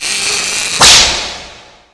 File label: damage; debeste